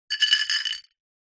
household necklace beaded set down in ceramic bowl or surface